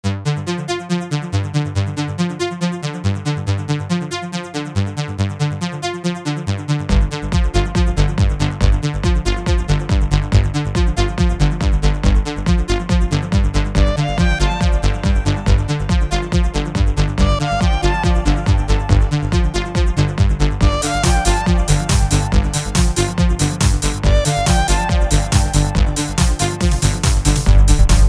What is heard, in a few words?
Synth Scape